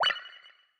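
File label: System Tap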